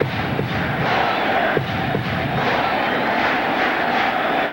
Baseball Fanfare; Crowds

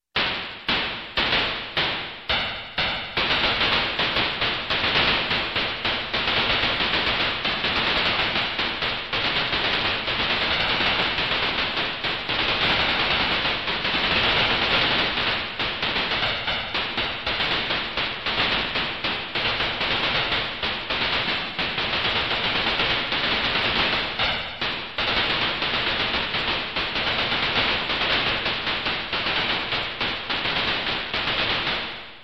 Space Gun, Gun Battle, Electronic; Sound Ideas 2000 Series

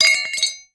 Metal Ting; 1001 Sound Effects

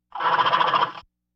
Laugh tracks

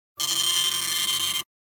computer printer; NCH Library

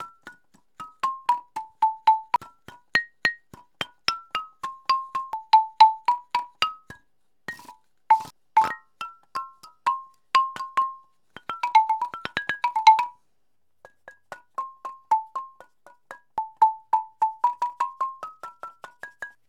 Percussion Native Wood Xylo; SFX Kit; Foley - Percussion

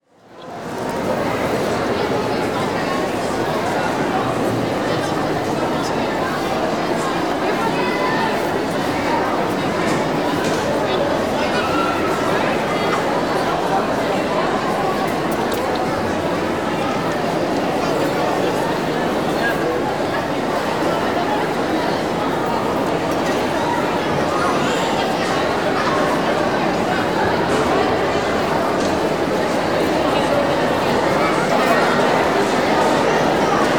Crowd Indoor Large Room; Walla; Sound Ideas; Walla Series

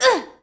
Boxing Female Punch Grunt

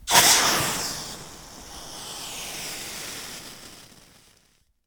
Steam Sizzle Hit

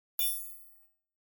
Button Spring Thin Sci-Fi Button; Hollywood Edge; sound designer's toolkit v2

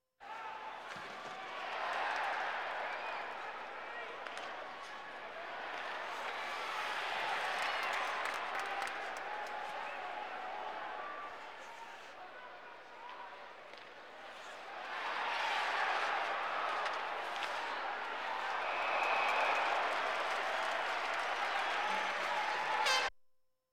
Sports crowds

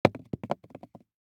DICE on Plastic, Throw and Roll, Foam, 2 Two Dice; Samples; ArticulatedSounds; DICE Sfx Party (Extended)

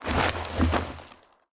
Bodyfall Snow; SFX Kit

